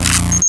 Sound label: act, visor; zaero; items